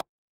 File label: ui pop